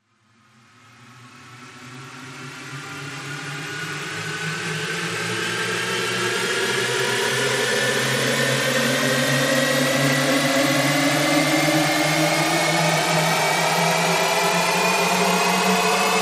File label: Rise Long